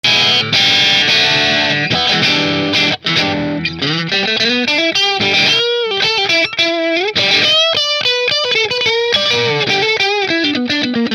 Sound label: p90 driftwood middle middle marshall; Nola Voodoo 3